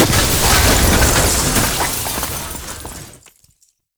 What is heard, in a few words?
Academy Door Glass Destroy; Tauron; Warframe; Betrayer